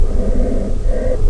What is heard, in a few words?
atmos